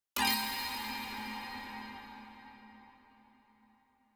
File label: String Pluck Chord Strike With Reverb; Hollywood Edge; sound designer's toolkit v2